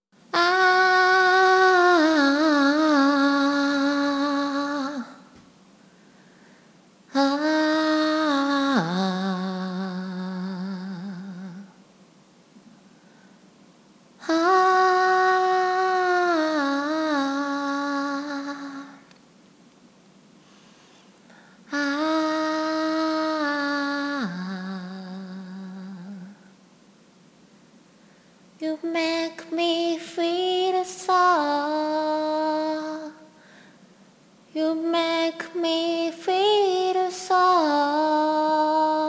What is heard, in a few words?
ahhh